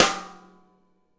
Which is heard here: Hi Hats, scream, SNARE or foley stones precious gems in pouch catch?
SNARE